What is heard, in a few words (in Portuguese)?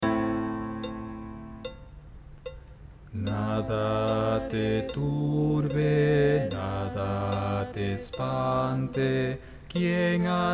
baixo